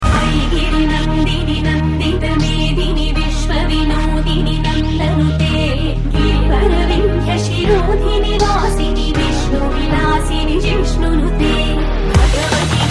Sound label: Fusion Kannada Ringtone